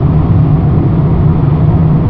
air suck, incinerator; hl2; citadel

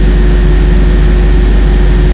air suck, incinerator; hl2; citadel

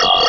remote no, roller; hl2

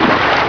water chop; hl2; nature

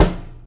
hit hard, metal file cabinet; hl2; physics

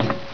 footsteps metal; hl2

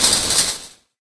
metal hit, wires; hl2; d1 terminal 01

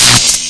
spark, electric; hl2

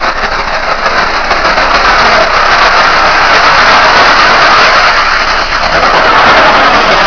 suction, incinerator; hl2; citadel

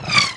bullet L to R, nearmiss; hl2

